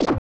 Hit

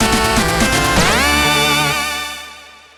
hunt win; The Touryst; PS4 PS5 Switch Windows Xbox One Xbox Series XS gamerip 2019

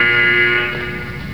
Alarm; Desert Rats vs. Afrika Korps

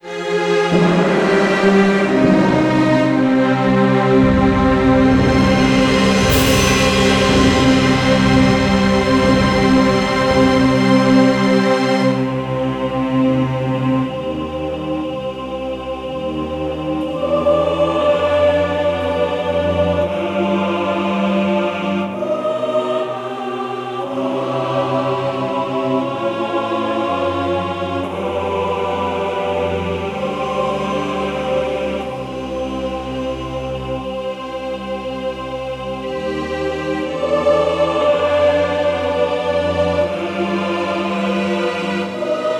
Fail; yager windows xbox gamerip 2003

